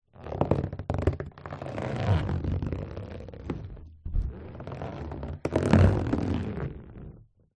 balloon rub squeak on wood; zapsplat

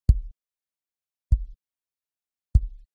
OBJECT ELECTRONICS Microphone Pop On Soft; smartsound

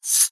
technology mixing desk fader slide short fast; zapsplat

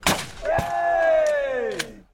sport clay pigeon release shoot hit cheer uk

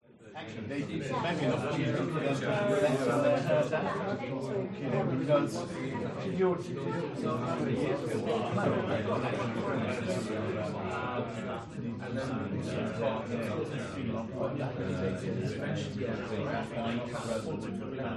Networking Event 15 20 People Walla; gain walkers

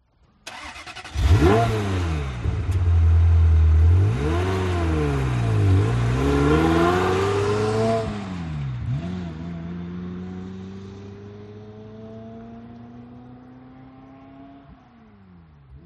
Auto Ferrari 355 Gtb; audio hero

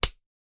office pencil snap wooden

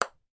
sport table tennis ping pong bat hit ball

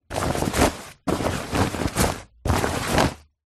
household bbq cover movement; zapsplat

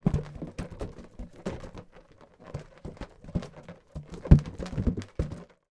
foley 2 inflated balloons movement together

